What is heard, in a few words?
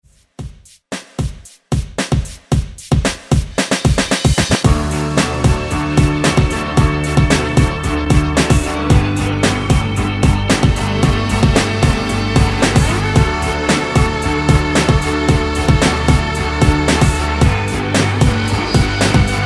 70s ReDrum 113Bpm; DJDOLLS